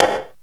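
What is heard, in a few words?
snare reverb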